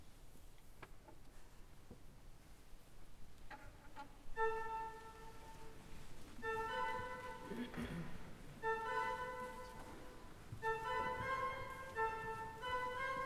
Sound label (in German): Orgelvorspiel; St. Aegidien